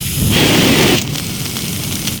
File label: Flamethrower